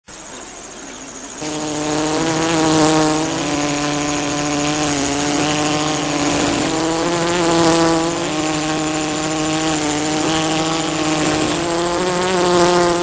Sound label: Impatient fly ringtone